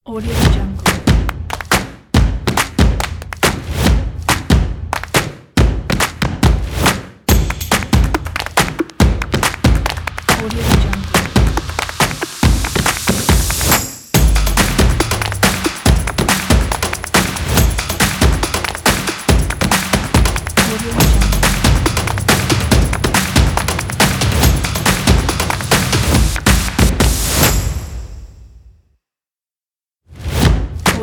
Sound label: dynamic kit